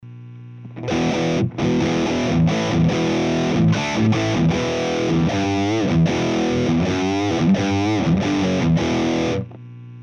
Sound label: Uber Cab, podhd